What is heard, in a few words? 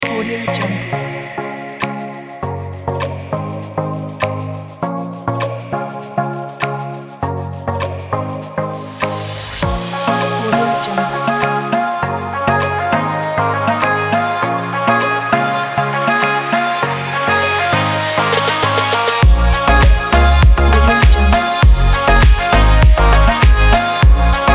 for reggaeton